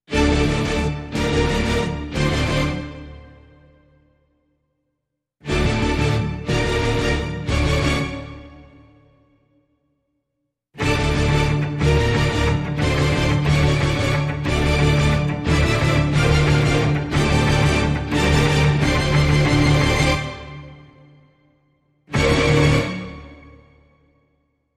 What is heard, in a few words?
No Perc No FX